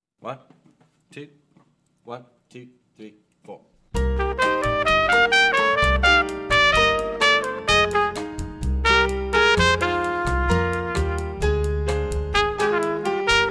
latin etude section trumpet

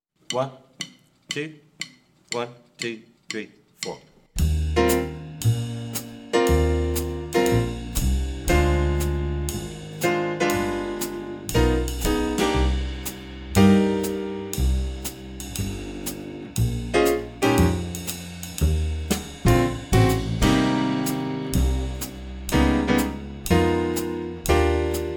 swing etude guitar and vibes muted

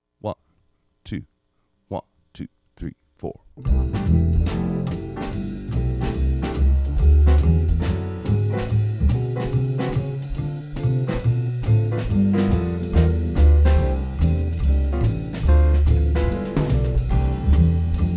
swing full rhythm section

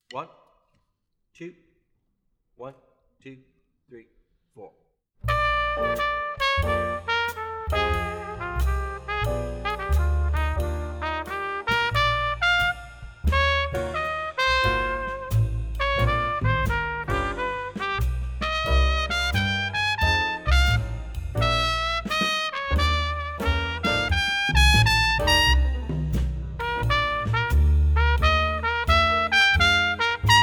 swing lead trumpet

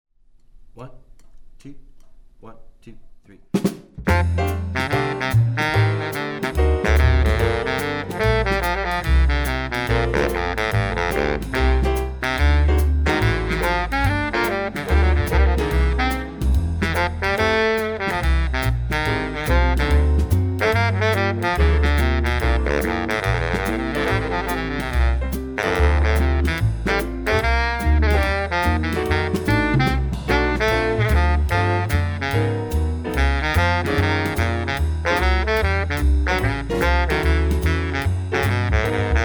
swing etude bari sax

